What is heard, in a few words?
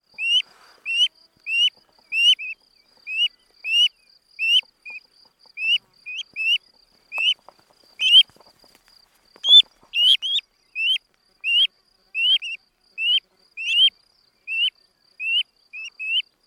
Common Crane